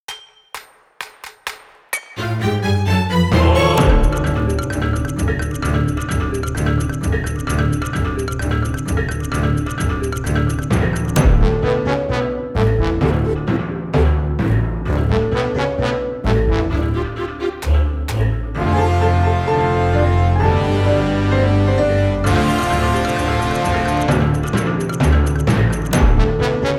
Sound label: Talus Battle; The Legend of Zelda Breath of the Wild Sound Selection